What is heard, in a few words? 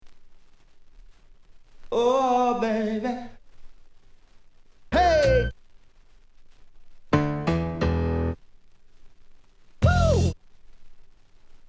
samples scratches